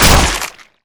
demon claw strike; nh demon

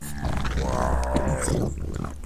moan loop; shamblie; npc

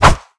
shovel fire

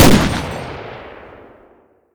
m249 fire; weapons; npc

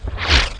bullet L to R slomo, near miss; weapons

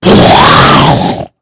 attack, mummy

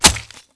body stick, scissor

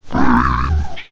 growl; shamblie; npc

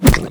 claw strike; shamblie; npc

